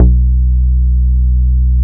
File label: BASS, Bass Analog & Synth, GARAGE; USB Soundscan vol.12; AKAI; TONY